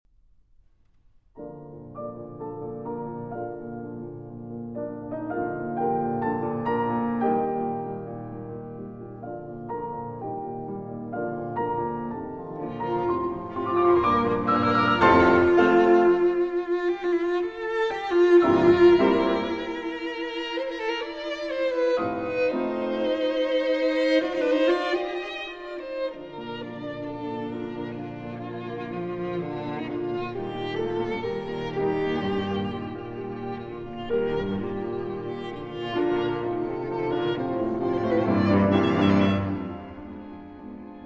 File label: Piano Trio in B-Flat Major, Allegro Moderato; Op. 97; Archduke